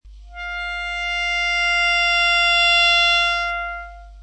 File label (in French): sol, clarinet